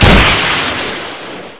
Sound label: fire rocket